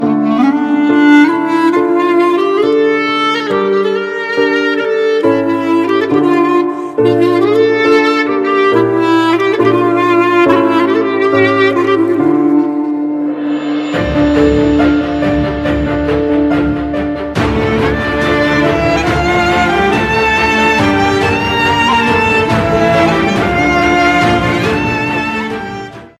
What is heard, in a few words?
sad clarinet ringtone